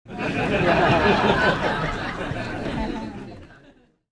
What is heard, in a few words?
Laughter